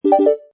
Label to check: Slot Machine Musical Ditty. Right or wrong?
Right